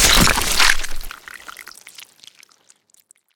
knife hit; Original TK Sounds

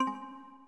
bold, Old TalkBack Sounds

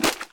fire step; Golden Crayon Sounds

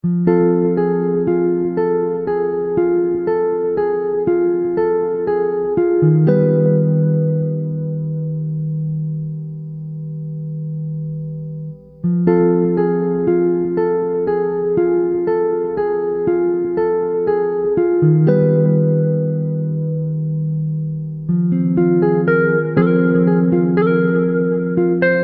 shadow canyon; Manamon Music; Manamon 2

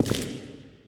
stone step; Golden Crayon Sounds

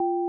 Skype Dtmf 9; Skype Sounds

